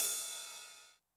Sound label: ride cymbal Med, 909; classic machines; 909mod; RC - RIDE CYMBAL